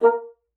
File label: bassoon, A#4; Xsample VOL-6